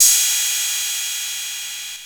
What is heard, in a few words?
CRASH, 808; PERC; musicradar-synth-samples; Classic drum machines